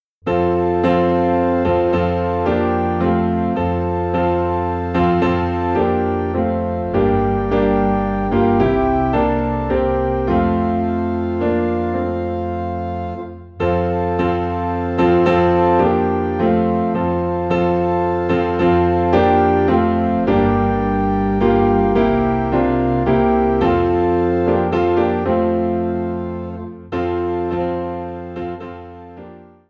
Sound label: Piano and Organ in F